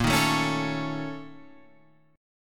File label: A sharp Minor Major 7th Double Flat 5th, A sharp, down